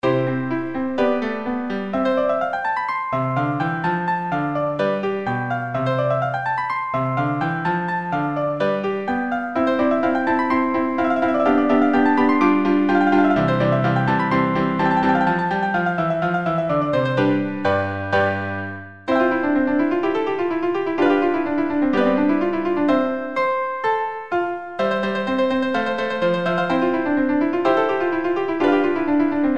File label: Regina Coeli K108 PRELUDE; 4. allegro; piano; Mozart